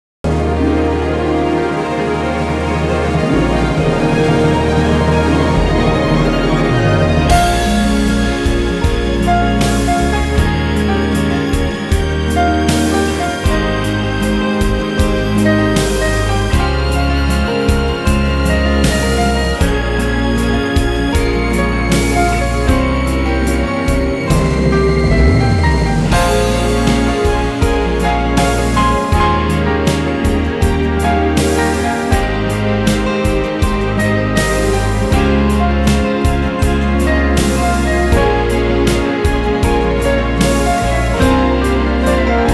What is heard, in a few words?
piano